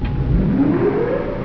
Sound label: Elevator Move